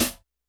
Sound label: Hiphop hihat